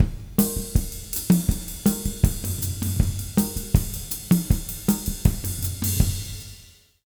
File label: 160, Jazz Latin Drumloops